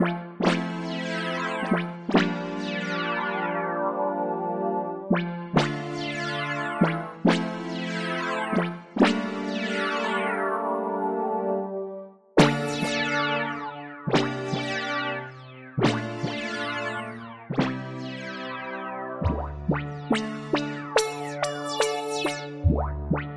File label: lead quantum morph